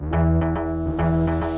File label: Pink Purple Button Opening Title Triples